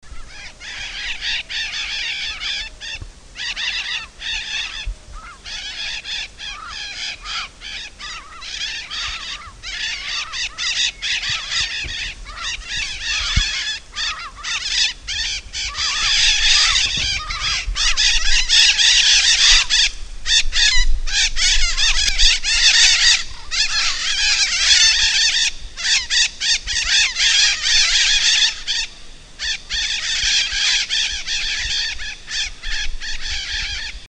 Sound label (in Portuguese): canto da maracana nobre